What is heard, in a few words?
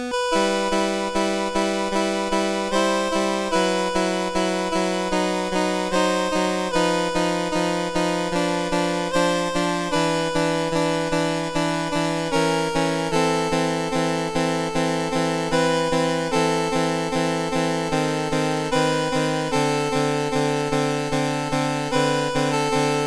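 Prelude in E Minor Op28 No4, MOS 8580 SID chip; CSG8580R5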